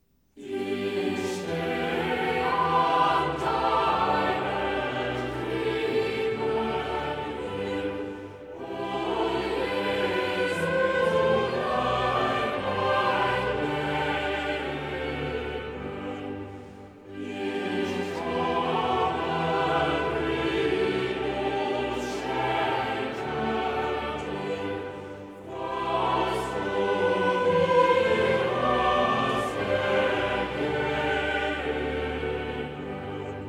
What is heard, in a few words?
Choral; Cantata #6; Ich steh an deiner Krippen hier; Bach; Christmas Oratorio, BWV 248; Ph. Ledger